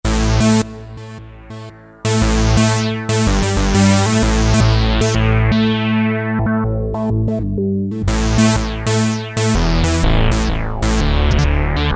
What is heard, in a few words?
SWEEP BASS; Akai SG01v